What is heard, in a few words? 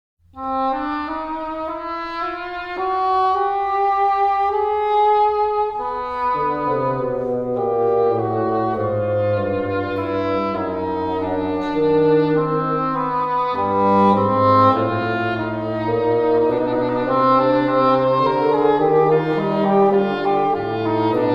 Canon a 2; Bach; Musical Offering, BWV 1079; Quaerendo invenietis